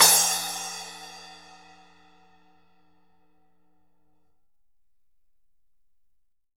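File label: SPLASH, cymbal; Big Fish Audio; Ross Garfield Drums VOL-2; PASADENA KIT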